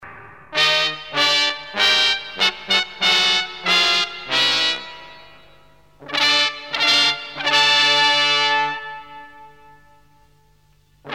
Fanfare

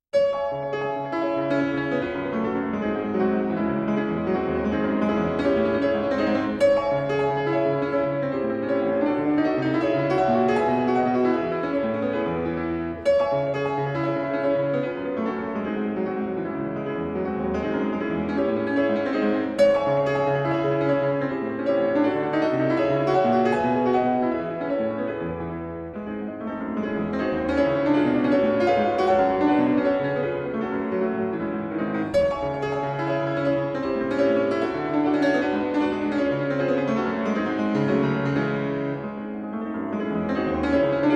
Symphonic Etudes, Piano; Schumann

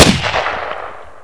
gunshot

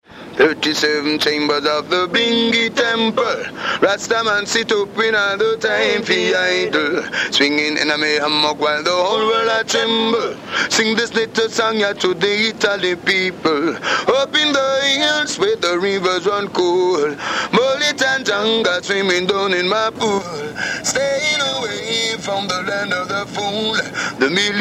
jingle

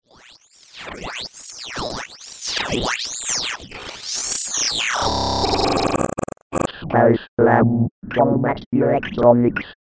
combat electronics

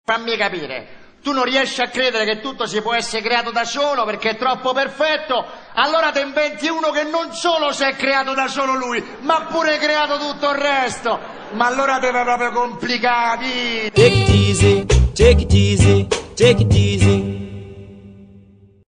Jingle

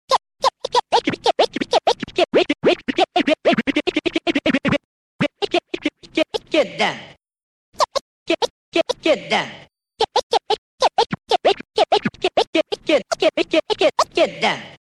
scratch

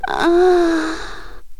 Aaaaah (Female Voice)

